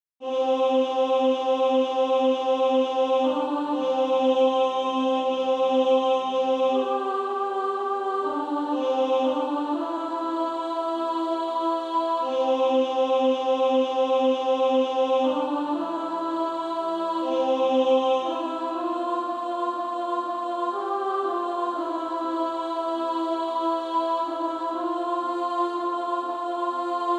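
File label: Female Choir, alto; Redeemed How I Love To Proclaim It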